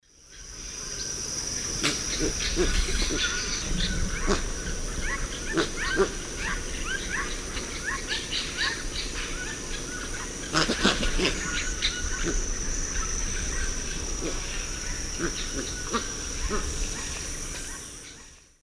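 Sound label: Tigrisoma lineatum, calls, birds; S. America; Eastern Lowlands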